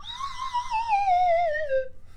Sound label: Wobble Fall, slide whistle